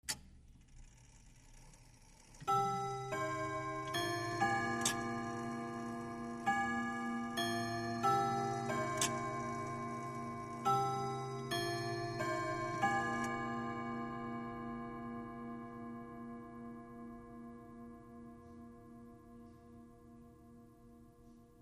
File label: Grandfather Clock, Chime Melody, Medium Perspective.